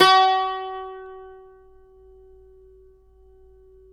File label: cumbus; Roland - LA Composer vol. 3